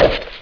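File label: tongue; mk2; Reptile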